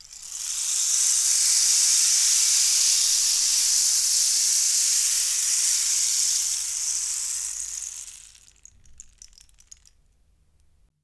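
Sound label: rain stick; Univers Sons - Raricussions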